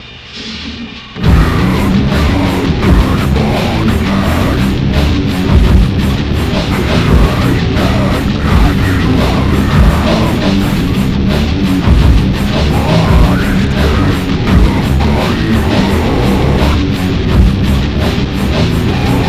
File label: Fret Free Funk